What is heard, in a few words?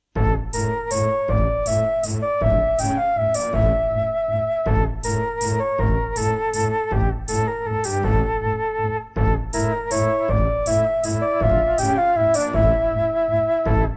Serdaco Dreamblaster X2GS BURAN V1.1